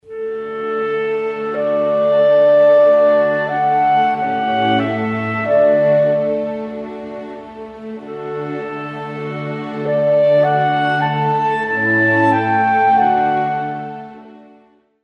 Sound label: mozart clarinet concerto; Clarinet Concerto: II; 1791